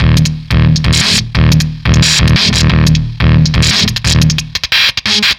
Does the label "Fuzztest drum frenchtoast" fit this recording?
Yes